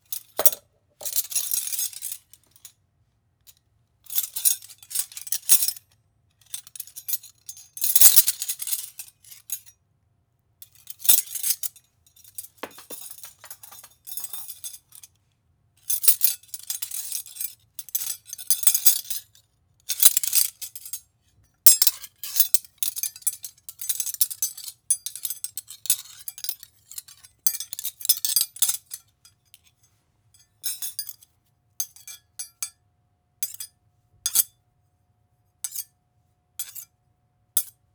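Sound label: Foley, Cutlery, Handful, Movements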